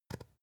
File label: spice jar big scratch close short; industrie wirtschaft; gastronomie